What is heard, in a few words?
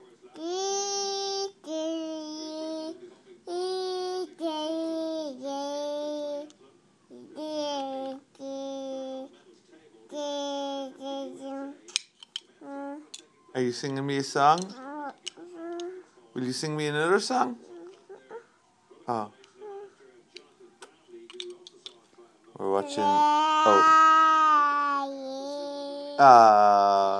(age 2) singing